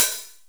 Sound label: Real Closed Hats